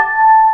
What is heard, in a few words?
combo power; tetrio